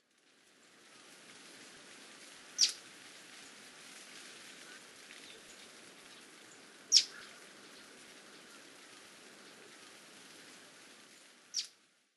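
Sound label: zitting cisticola, watarase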